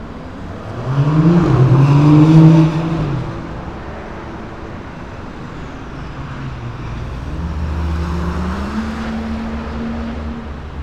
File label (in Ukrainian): Звуки гонок після тривоги